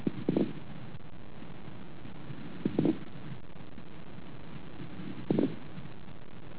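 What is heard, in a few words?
early rales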